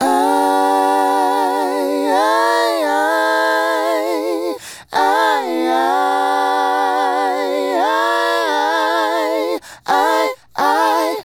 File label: Male Group, Hip-Hop and RnB Vocals